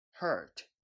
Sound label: hurt